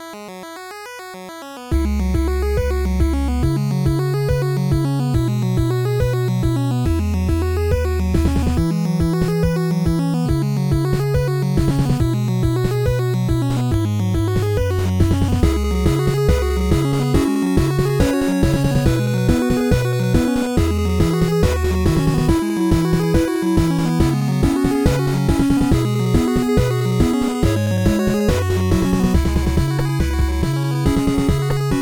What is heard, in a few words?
Title Theme; Fourth Movement